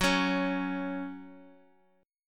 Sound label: F sharp 5th F sharp; strummed; JGuitar